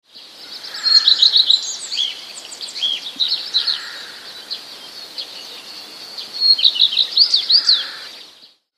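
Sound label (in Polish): pleszka, dwie piosenki pewnej pleszki. Druga piosenka w końcówce zawiera gwizdy bardzo podobne do śpiewu sikory ubogiej; Samce są niezłymi śpiewakami; wroblowe; zapozyczenia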